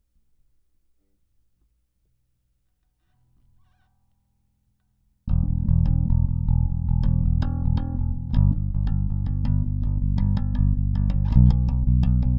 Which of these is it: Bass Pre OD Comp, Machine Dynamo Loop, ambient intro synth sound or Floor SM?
Bass Pre OD Comp